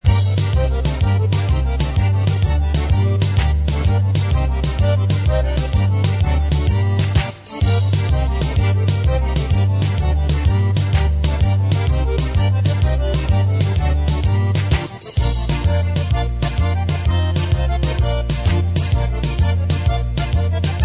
swing bop

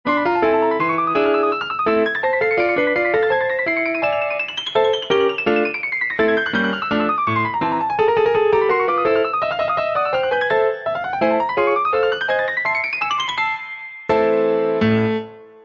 Flight of the Bumble Bee piano

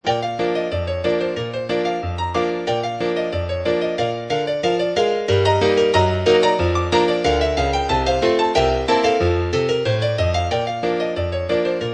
waltz

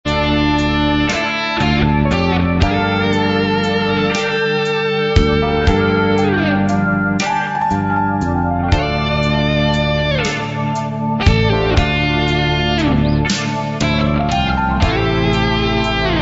Sexy Blues

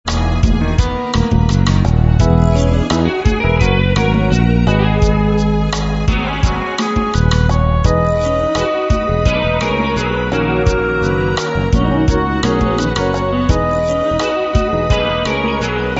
Latin Smoothie

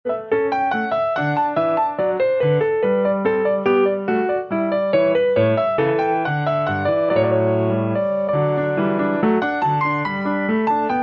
French Suite5 Allemande

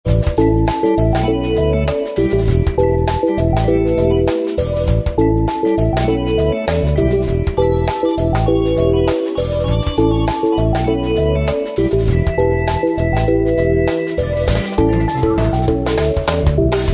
Groove; bg-music

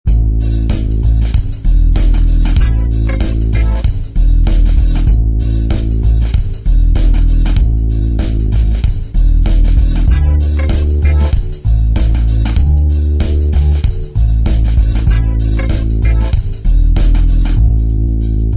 City Grooves

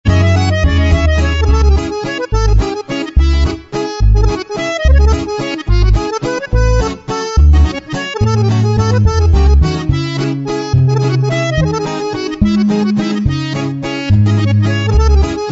French Cafe; bg-music

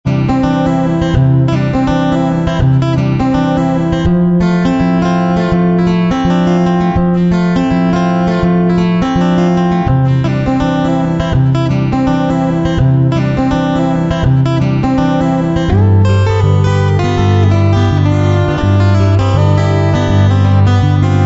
carefree picking

